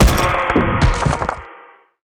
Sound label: GRAVESTONE WAR DIE; Mob